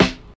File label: sharp snare drum sample f key